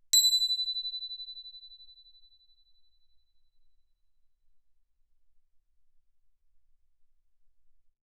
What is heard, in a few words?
crotale B7 ff; Percussion; crotales